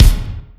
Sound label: kik, drums; virtual drum sounds